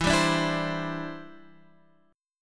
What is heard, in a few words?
E Minor 7th Sharp 5th